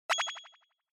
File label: Onoma Surprise Low Short